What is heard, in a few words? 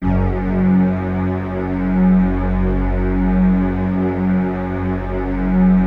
PAD, Dance Mega Synth